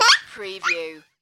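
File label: Toddler Laughing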